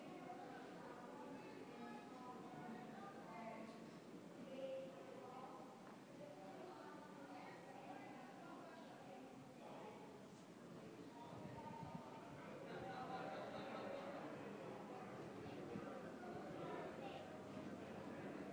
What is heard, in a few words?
outside elphinstone hall university of aberdeen